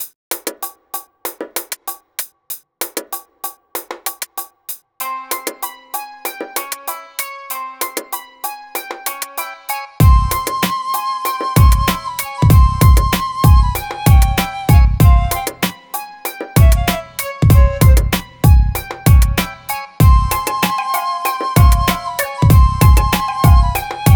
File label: 096 Cmin, Hip Hop Exotica; Apple Loops; Big Fish Audio